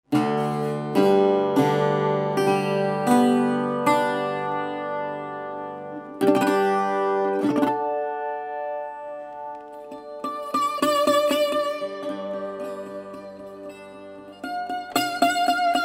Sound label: 12 string, Delta Resonator Guitars